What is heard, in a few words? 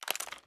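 sniper reload fast shake; gmod; iw8; xmike109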